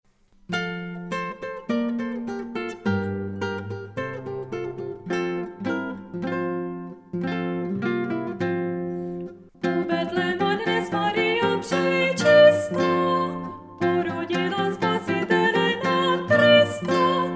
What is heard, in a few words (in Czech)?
zpev kytara u betlema dnes maria